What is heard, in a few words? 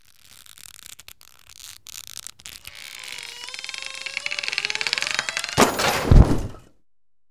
ropes strain crash